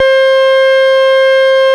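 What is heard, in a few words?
CX3 overdrive C3, ORGAN; Sonic Reality; Vintage Timetraveler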